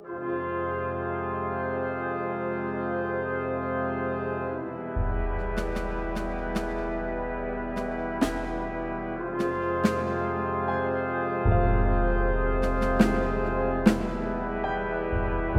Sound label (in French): Classique